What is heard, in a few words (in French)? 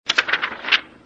Page tourner